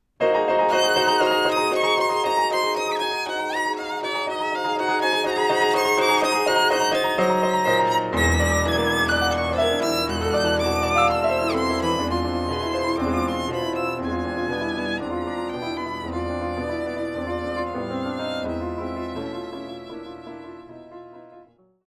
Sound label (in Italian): Concerto Violino e Pianoforte